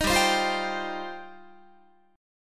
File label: G 7th Suspended 4th Sharp 5th, Guitar; Listen to G7sus4#5 strummed; G7sus4#5 Chord